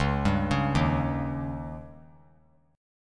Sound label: Db Suspended 2nd Flat 5th; arpeggiated; JGuitar